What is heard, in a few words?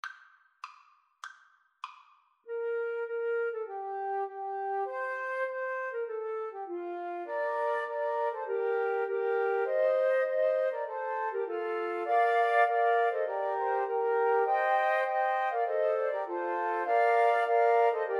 i love the mountains Flute Clarinet duet